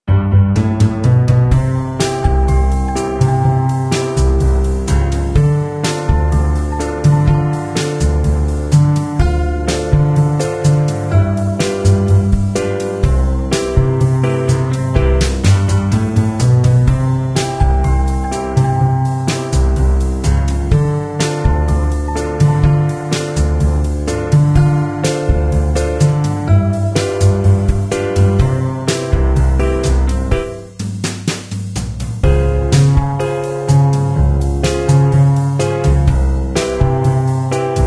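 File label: loop instrumental; doomsday clicker android ios gamerip 2016; have you pushed the button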